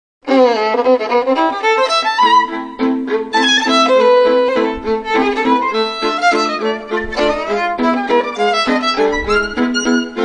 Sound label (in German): Walzer